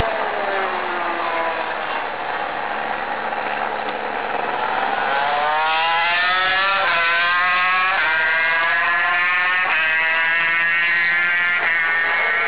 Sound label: 2st vs 4st; motogp